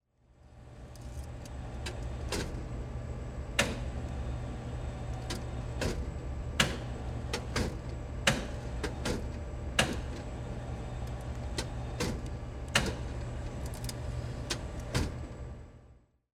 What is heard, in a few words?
food vending machine turn